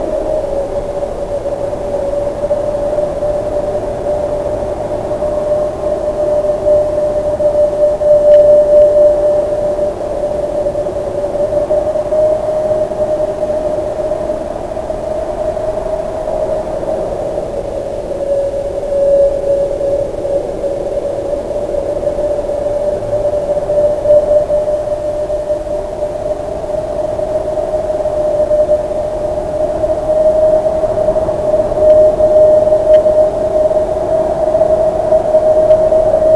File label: scary wind, ambience; svencoop; AoMDC